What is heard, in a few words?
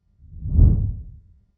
subtle air glide